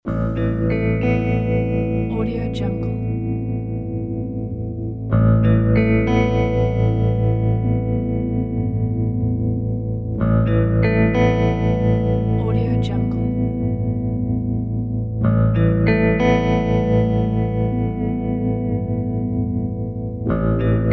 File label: lonely cowboy; r-production